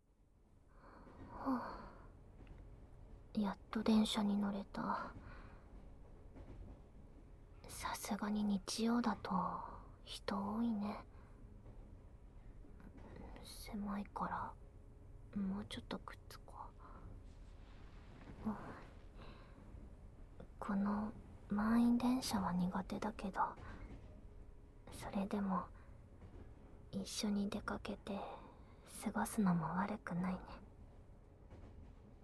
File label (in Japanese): 電車で耳舐め囁き手コキ; SEありver